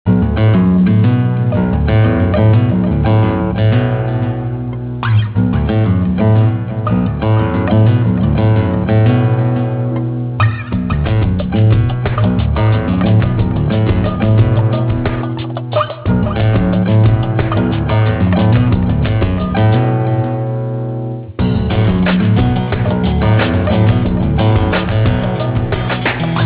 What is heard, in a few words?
queue latin beat 135